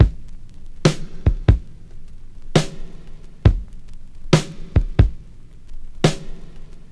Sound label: 69 bpm drum beat g key; drum loop